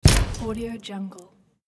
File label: old camera flash poof